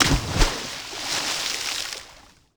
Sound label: land hard water